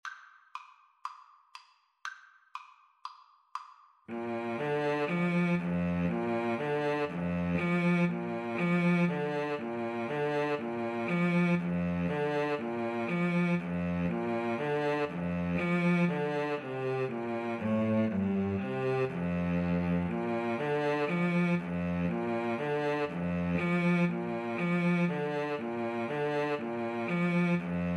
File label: trumpet cello, Trumpet-Cello Duet; clarke tpt tune